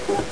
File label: fax feed